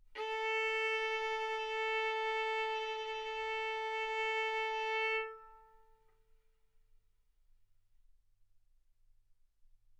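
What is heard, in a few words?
Violin arco mf A string A4 B4 stereo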